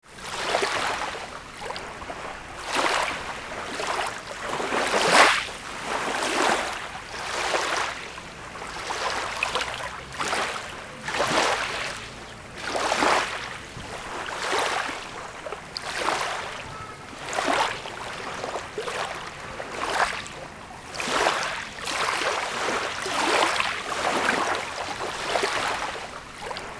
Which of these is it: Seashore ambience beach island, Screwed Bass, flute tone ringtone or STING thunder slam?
Seashore ambience beach island